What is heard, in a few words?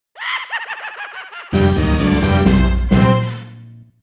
Fight Over; Mudville Mansion - Spooky Spire